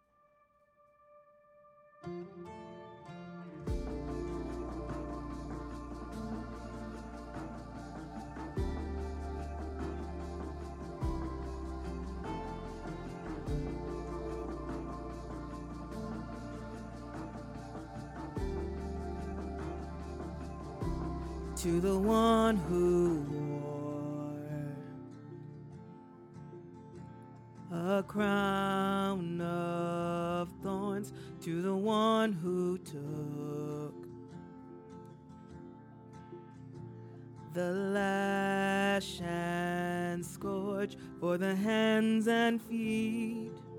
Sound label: Full Choir; 2024 summer choir